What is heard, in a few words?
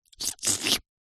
cartoon lick